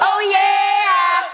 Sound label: "oh yeah" CHANT